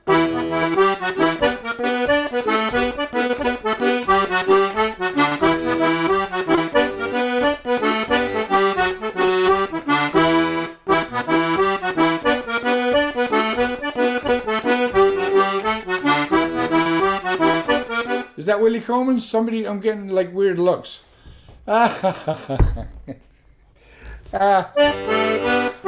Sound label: Jig